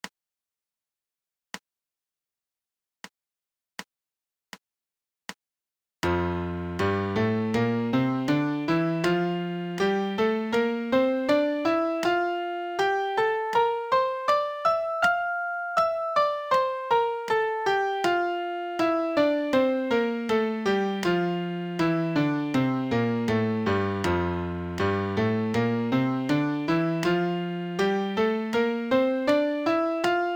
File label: MIDI Major Scale F 80; Piano Practice Tracks